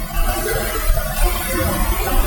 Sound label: evp very eerie